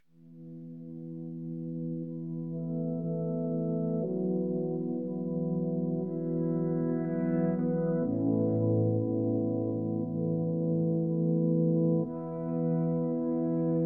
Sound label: lord victory; Virtue Slots